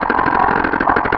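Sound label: Robot Tailbot Ambient; D3DEMO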